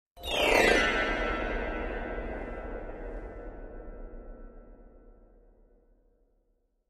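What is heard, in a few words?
Grand Piano Strings High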